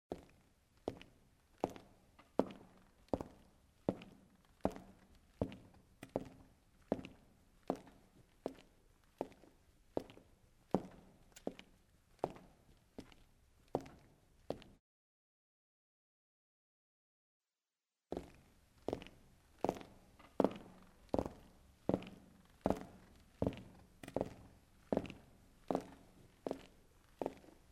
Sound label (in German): echo